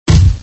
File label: Thud Sound